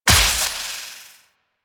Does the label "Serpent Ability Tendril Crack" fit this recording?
Yes